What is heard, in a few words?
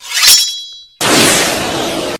knife dash; ares